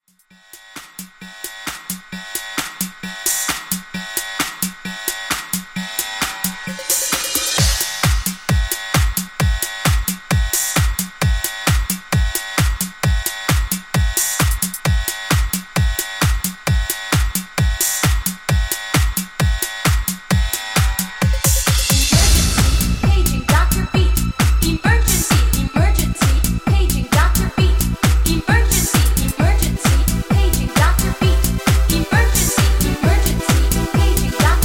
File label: Epic Mashup 132Bpm